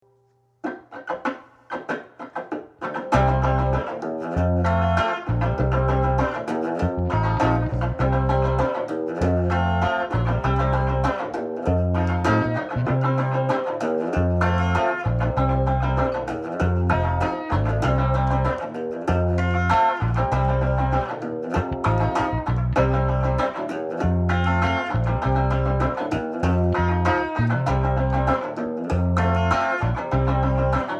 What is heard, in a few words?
Play Along Bass; a bass line for the jam